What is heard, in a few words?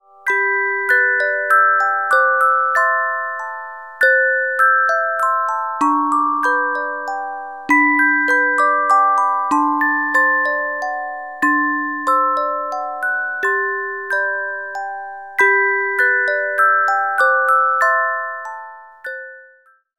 Carillon Music Box Melodies